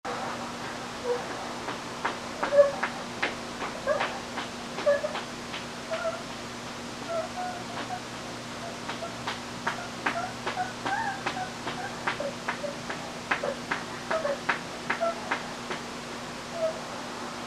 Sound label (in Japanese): えち音声